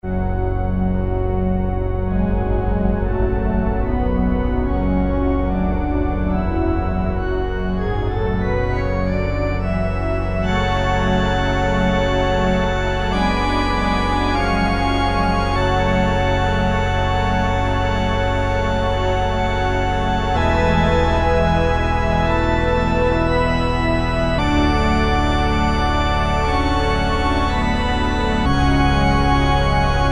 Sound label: Cathedral Organ, Roland MKS-70